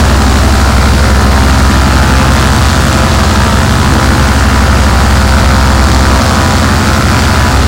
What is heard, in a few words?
an2 side, engines; War Thunder